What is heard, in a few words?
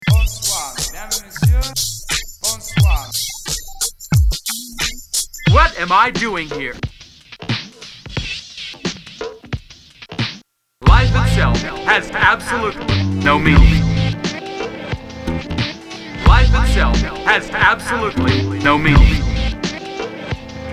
blues